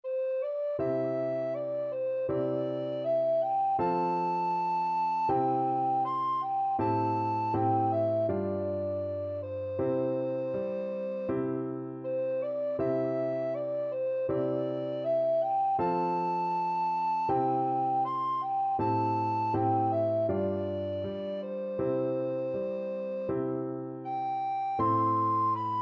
sally gardens, alto recorder

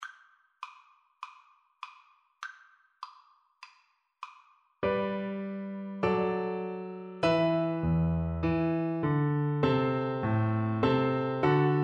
March, Flute; Handel

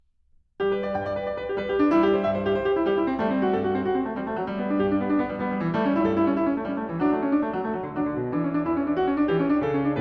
Praeludium, piano

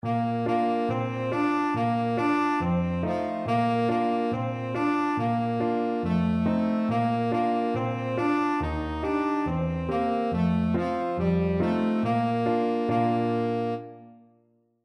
tenor saxophone

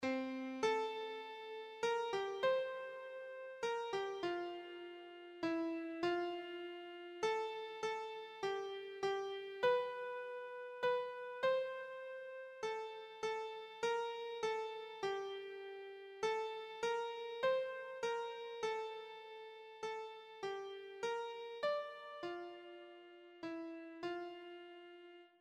crimond, keyboard

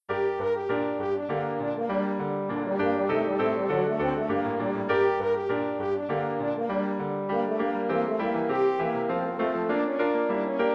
french horn

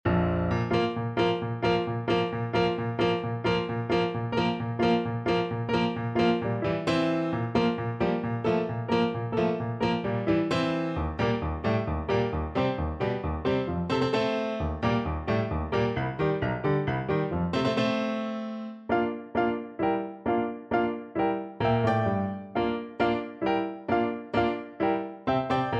romanian folkdance, Trumpet; bartok

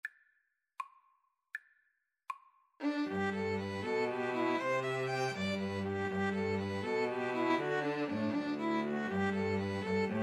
2 Violins Cello; merry christmas

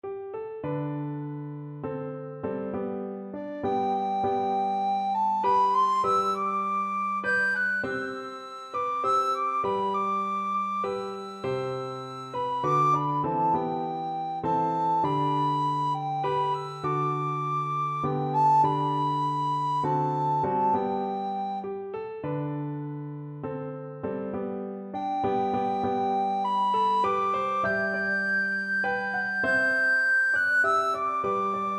recorder

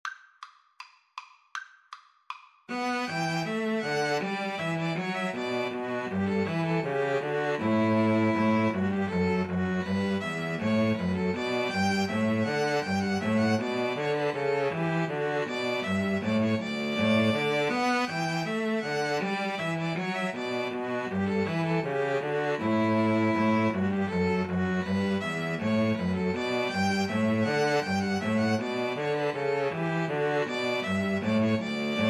bourree, String trio

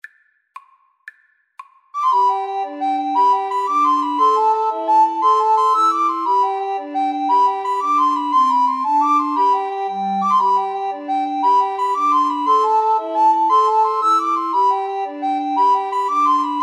irish washerwoman, Recorder Trio

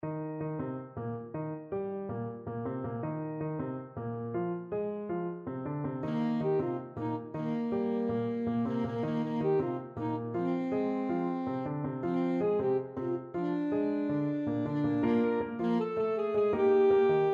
jingle bells salsa, saxophone

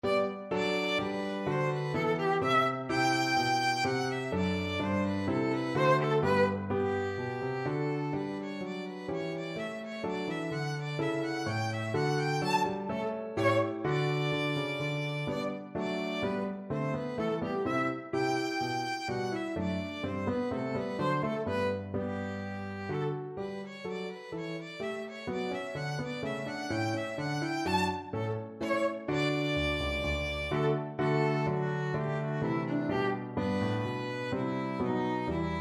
bourree, violin

